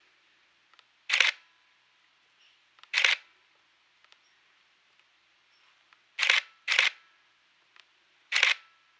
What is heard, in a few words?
Camera Shutter Slow